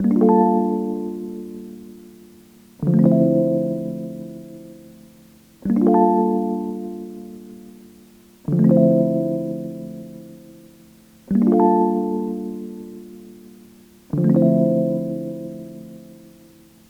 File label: E Piano - 85 BPM D Min; Melodics; Cymatics; Eternity Sample Pack